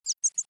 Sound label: hooks audible